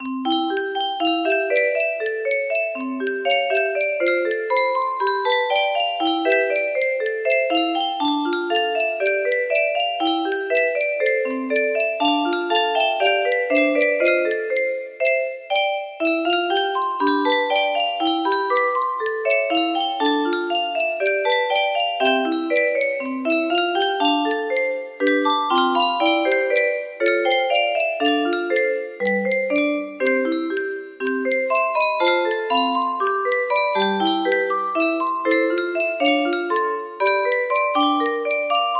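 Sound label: music box melody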